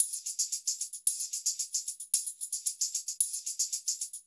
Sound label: TAMB, TAMB + TRIANGL; Spectrasonics; Liquid Grooves 1 & 2